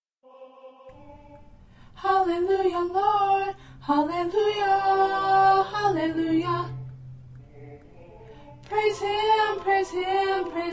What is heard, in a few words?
Alto